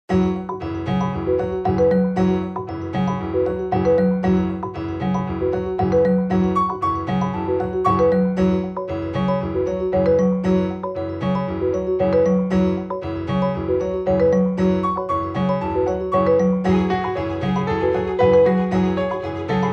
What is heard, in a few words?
Pools; Noisebiscuits